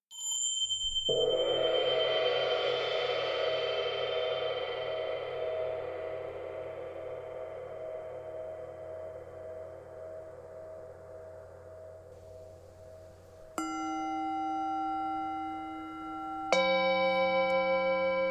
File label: Gong and Bells and Synth and Subliminals